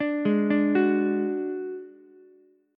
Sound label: F sharp Suspended 2nd Sharp 5th, Ukulele; arpeggiated; JGuitar